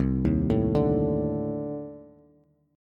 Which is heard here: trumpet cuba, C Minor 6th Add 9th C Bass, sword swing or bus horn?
C Minor 6th Add 9th C Bass